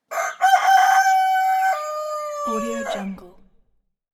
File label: rooster